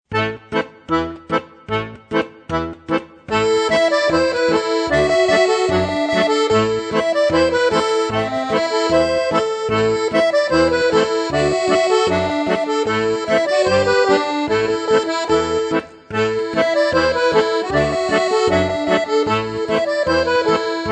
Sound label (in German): Rumänien, Accordion; Jiana